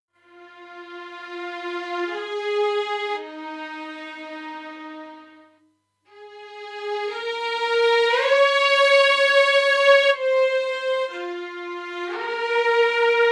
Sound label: V Solo portament; オーケストラサウンド; 『新・DTMオーケストラサウンドの作り方』